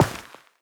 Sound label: step, footsteps, player, carpet